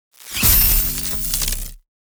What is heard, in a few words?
Electrical energy Impact explosion burst; shockwave